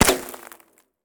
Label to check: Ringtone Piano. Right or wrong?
Wrong